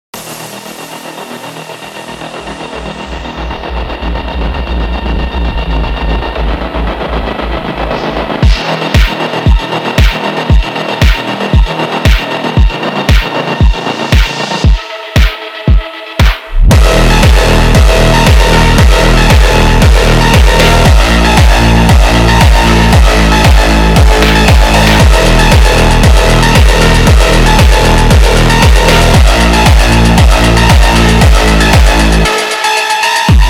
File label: slowed, funk